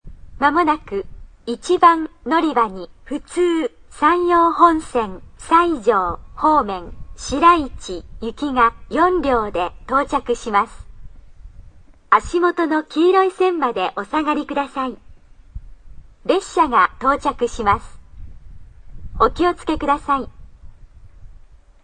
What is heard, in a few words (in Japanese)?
tenjingawa sekkin, ekihousou; sanyo-line